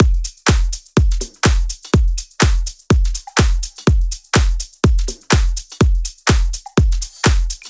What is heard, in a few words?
Drums Clean After